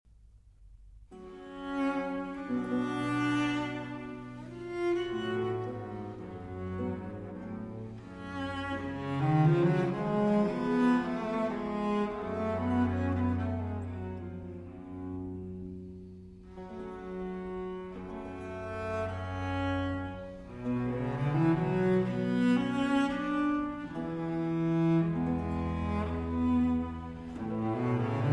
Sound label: Cello Concerto in G Minor; Adagio; Vivaldi